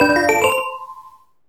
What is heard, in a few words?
SE GAME BREAK; maimai